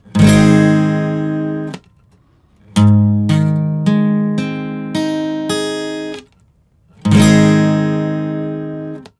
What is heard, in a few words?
Major or Minor